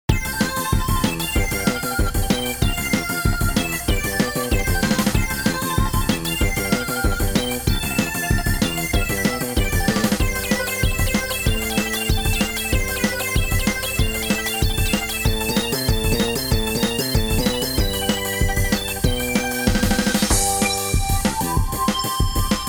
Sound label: skateboard